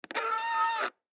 Drum Tune, Drum Tune Turn Key Squeak; Drum Kits; Blastwave

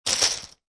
Poker Chip Piles Dropping On Poker Chip Piles; Playing Cards, Poker chips

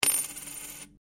Coin Penny Single Spin Laminate; Common coins; Money; Objects

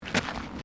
Footsteps Concrete Step; Crocs; Footstep Foley; Footsteps (Sound Effects:Human)

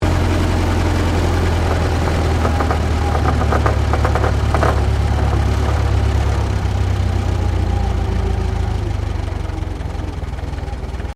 Truck Chevrolet 1926 30cwt Decel

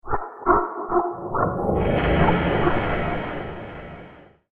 Underworld Atmosphere; monster; fantasy; Cerberus - Underworld Atmosphere; Monster Sound Effects; role playing games, magic videogames

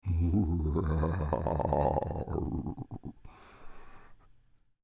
Creature Devilish Laugh Lite, Human; Horror; Evil Laughs; Big Room Sound

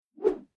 Whoosh Swish Sweep Long Bamboo Cane Low Pitch; Staff And Rod

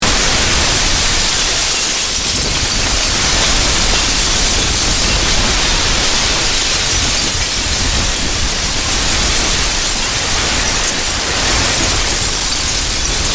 Crash, Mix, Rock, Glass, Wood, Long; Crashes (Sound Effects:Objects)

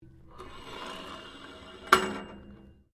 MECHANICAL PULLEY METAL METAL CLANK; Clanks

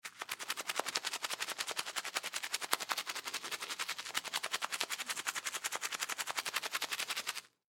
Human Brush Teeth Fast; Brushing

